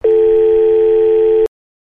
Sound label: dial